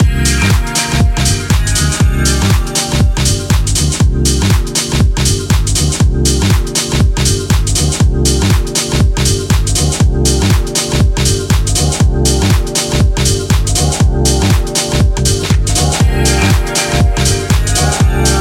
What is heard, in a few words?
Dub